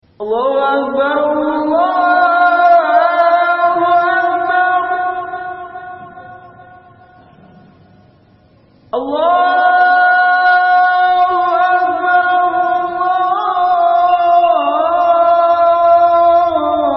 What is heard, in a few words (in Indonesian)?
Adzan